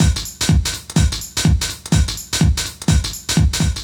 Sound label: DEEPHOUSE BEATS 125